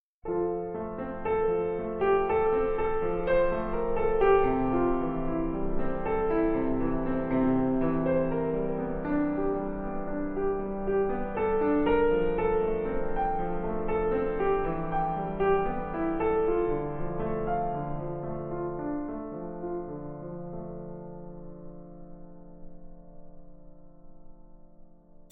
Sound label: Ambient